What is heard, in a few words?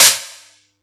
Yamaha EX-5